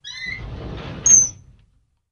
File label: Drawer Metal Open Qu